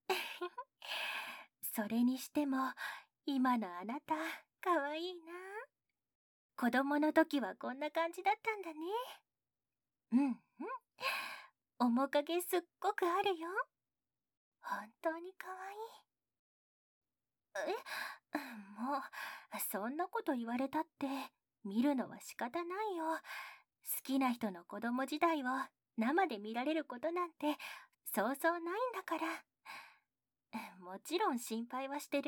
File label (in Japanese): 密着耳舐め, ASMR